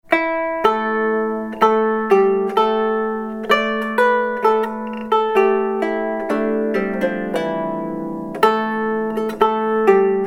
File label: New Harp